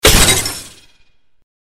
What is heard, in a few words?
headshot; css; hitmark